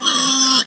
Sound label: Pig grunt